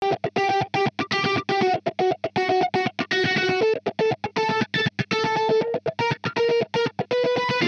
amp guitar flanger; Morphdrive

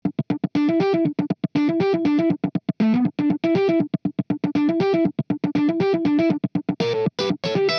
amp guitar funk; honeybee; Morphdrive

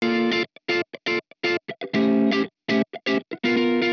amp wet guitar funk; souldriven; Morphdrive

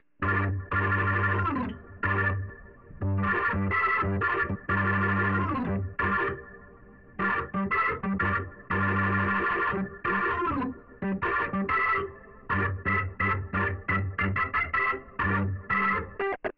organ; wet; multiband; prism distortion

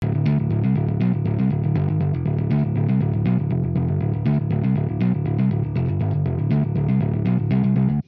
amp honeybee g5 bass; Morphdrive

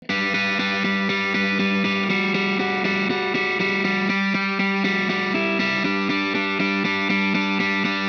amp wet guitar distorted; Morphdrive; Demos for hybrid configurations

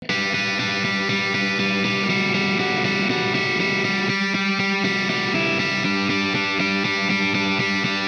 amp guitar distorted; souldriven; Morphdrive

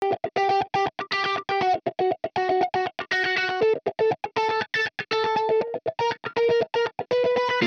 amp wet guitar flanger; Morphdrive; Demos for hybrid configurations

